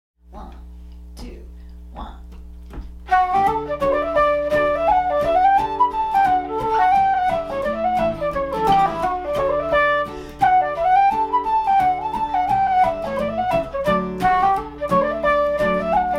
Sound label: Flute and guitar